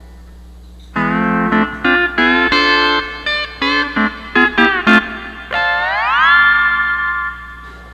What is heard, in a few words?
12 String Lap